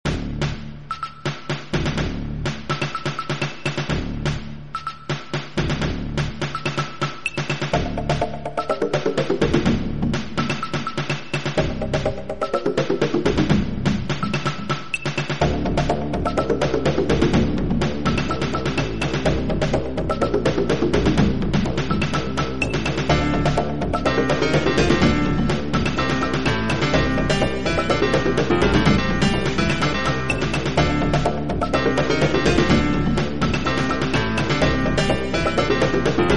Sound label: drum song; Pop Electronic; Happy